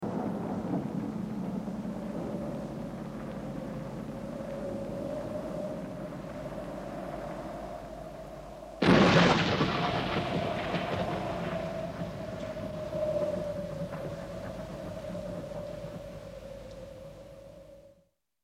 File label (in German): gespensterklänge; Horrorgeraeusche